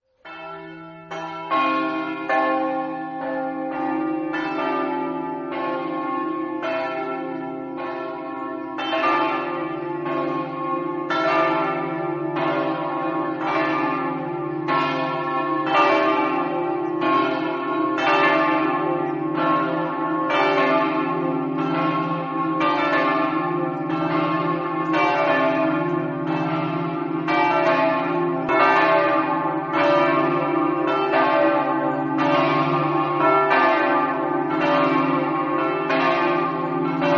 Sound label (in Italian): campane; pasiandiprato